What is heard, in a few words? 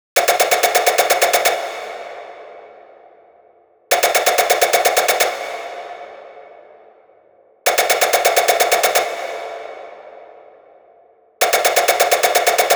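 128BPM Drop Lead wet NoSC, Synth, F minor; Vengeance Essential Clubsounds Vol.5; VEC5 Demo Constr Kits; Mainstage Kit; Root Fmin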